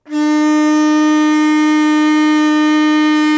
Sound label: World Winds, Old World Instruments; E-MU Producer Series Vol. 7 – Old World Instruments; World Combos